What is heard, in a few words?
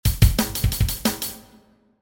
beat